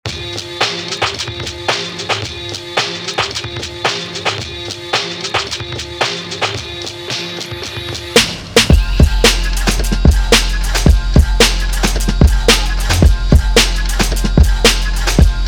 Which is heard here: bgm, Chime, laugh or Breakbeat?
Breakbeat